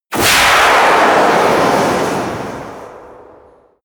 missile large fire airplane close

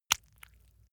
leather impact mud medium

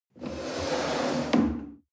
chair wood slide on floor medium bump

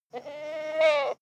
goat baa shy male

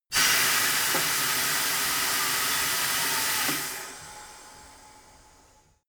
tire changing machine hydraulic out

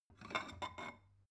stone bowl shelve light

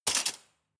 cutlery large drawer grab hard

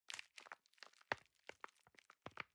envelope handle soft

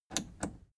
plastic button scratch click enable slow

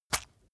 leather impact mud medium squelch

